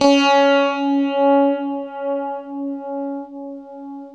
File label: UNIVIBE C#3, guitar; East West - Steve Stevens Guitar Samples Collection